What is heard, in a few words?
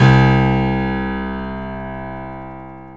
JAZZ PNO C2, jazz piano; Zero-G; Funky Elements